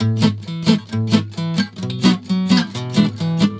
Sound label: GUITAR, Balkanish Traditions; USB Soundscan vol.63; AKAI